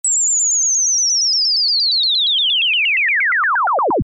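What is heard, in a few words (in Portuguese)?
REVERSO Estímulo 50-8000Hz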